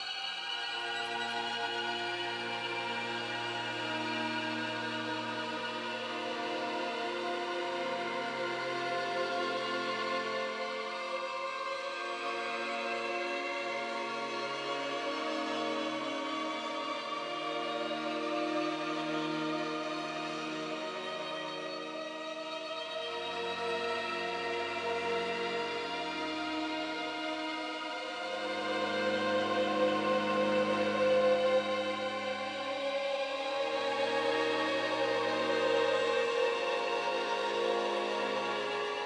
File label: Ambient Silk Tension Sub